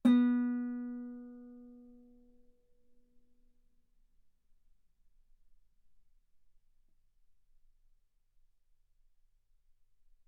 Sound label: Guitar B3; ff; sul B